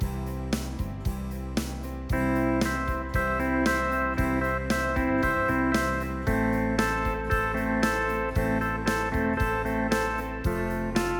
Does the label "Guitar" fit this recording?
Yes